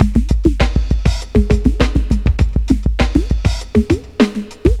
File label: electro boogie, 100bpm